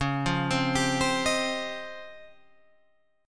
C sharp Major Sharp 11th, Guitar Open G

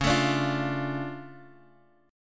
D Minor Major 7th Db

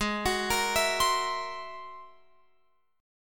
G sharp Augmented 9th G sharp Guitar Open F; G#+9 arpeggiated; x,15,18,17,19,19; JGuitar

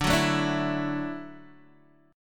D-Major Add 9th, D, Guitar, DADGAD

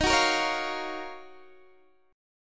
Eb Minor 9th, down, Guitar, DADGAD; Ebm9; strummed; JGuitar

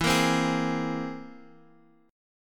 C Augmented 7th E

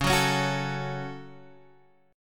C sharp Suspended 4th Sharp 5th C sharp, chord

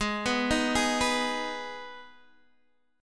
Ab Minor Ab down Guitar Open D

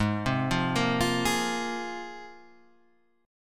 C 7th Suspended 4th Sharp 5th

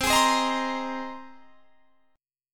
C Minor 7th Sharp 5th C; Cm7#5 strummed

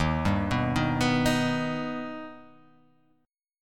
D sharp Augmented D sharp, chord

